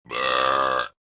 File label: big ol belch; Cartoon FX